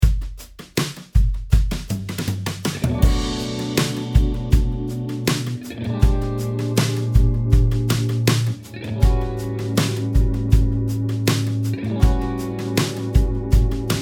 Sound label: Tritone Substitution Dominant 7th Resolution, guitar chord substitutions